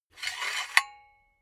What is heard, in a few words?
silicone gun empty pusher pull out slow; soundpool